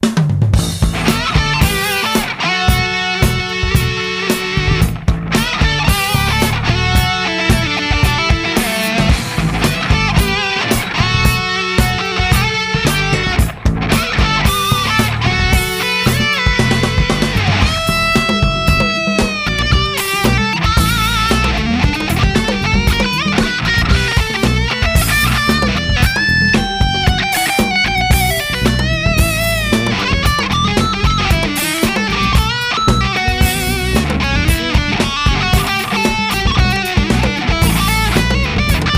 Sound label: riff; riffcontest paulgilbert